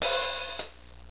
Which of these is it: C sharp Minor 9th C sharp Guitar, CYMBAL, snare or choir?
CYMBAL